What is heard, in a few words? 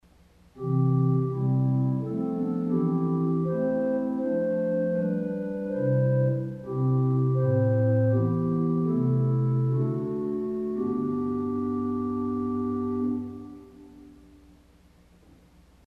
Doppelflote St Anne, organ stop; CulverAcademy